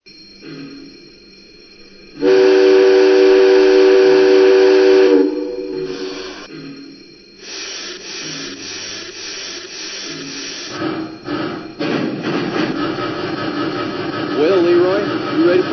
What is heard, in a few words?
TRIPLEX